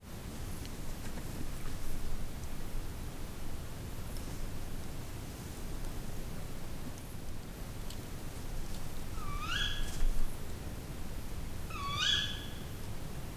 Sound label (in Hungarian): éjjel az erdőben, macskabagoly, 50 Hz; Aggteleki-karszt; termeszetben